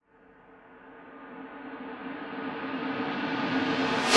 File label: Snare Shard; ZeroGravity Drum Shards